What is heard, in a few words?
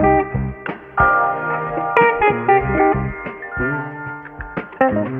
guitar, Loops Phonky